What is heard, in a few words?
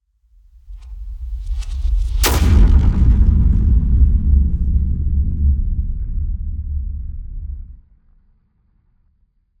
cinematic hit